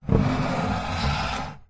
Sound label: household coffee table wood maple heavy legs slide wood floor medium